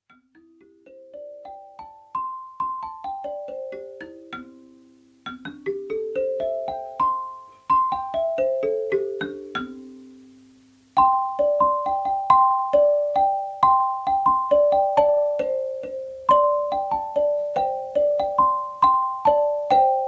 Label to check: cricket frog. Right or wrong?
Wrong